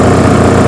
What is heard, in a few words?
midsize eng; Chase Hollywood Stunt Driver; XBOX